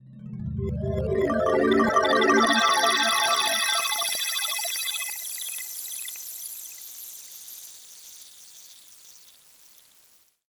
Textures; 99Sounds; The Weird Side Samples

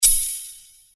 Sword Sound; FXHome; Fighting Sounds

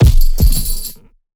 Punching Perc, Bag Drop; PROCESSED; 99Sounds; Punching Percussion

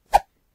woosh; Kenney's Sound Pack

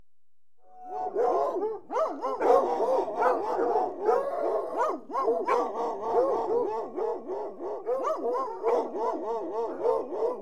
Hunting Hounds Growling And Barki; Horses & Dogs; BBC Sound Effects Library

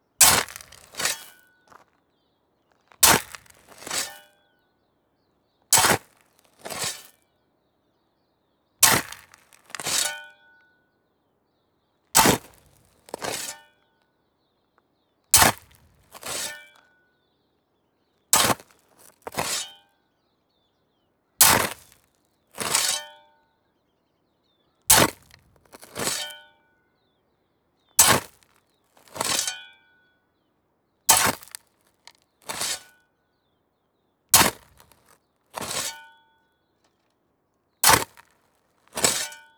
Digging; TheWorkRoom Audio Post

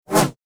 Double Bladed Saber Sound; FXHome

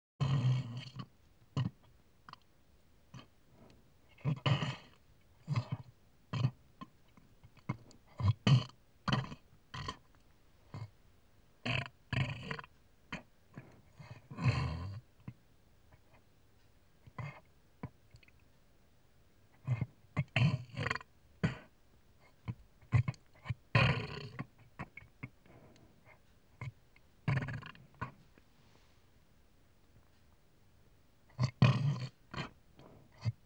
Animals, Pig; BBC Sound Effects Library

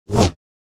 Saber; FXHome

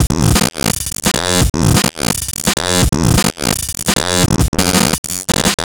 Loop, 170 bpm, Stutter Sounds; 99Sounds; Mangling Audio

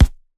Punching Percussion, Straight Glove, raw; 99Sounds

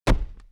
Whoosh land, Hong Kong Action Kit; NoizBoy; Hit Kit 2

